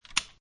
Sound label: Office Lamp Switch Off, Switch click; Switch click as offfice lap switch off; Household